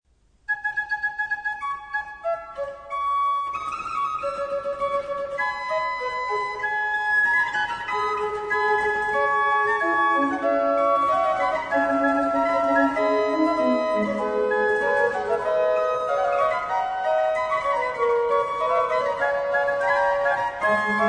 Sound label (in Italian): canzona terza